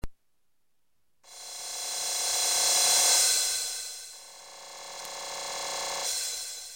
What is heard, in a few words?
roll